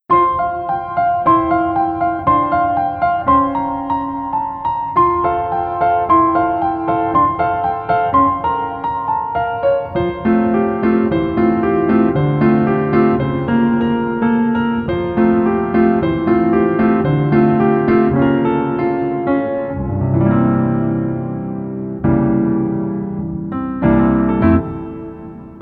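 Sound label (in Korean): Piano version 멜로디MR 남자키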